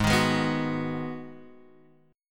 Ab Minor 7th, down, Guitar, Half Step Down

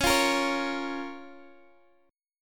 C sharp 6th C sharp down Guitar Drop D

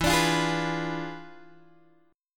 E 7th Flat 9th E down Guitar Open G

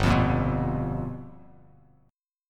G 7th Sharp 9th G chord, Bass Standard; G7#9 strummed; JGuitar

